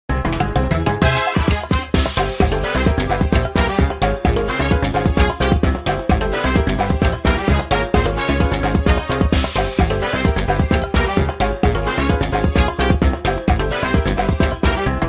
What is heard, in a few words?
theme; Cheep Chomp's Trick